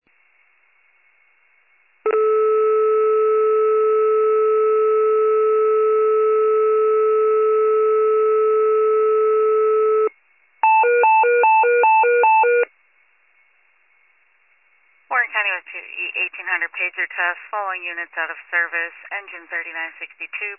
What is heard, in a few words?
fire, pager alert